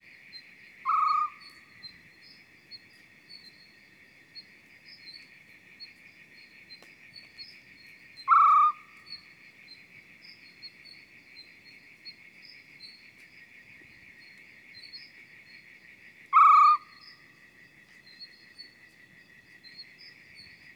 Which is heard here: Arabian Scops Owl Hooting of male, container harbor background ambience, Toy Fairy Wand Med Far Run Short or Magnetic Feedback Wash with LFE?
Arabian Scops Owl Hooting of male